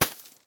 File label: tools snowball; teardown; ps5 windows xbox series xs